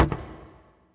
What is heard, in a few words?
step metal amb; SpecOps2 MPlayer Demo